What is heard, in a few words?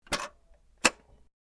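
CD player sounds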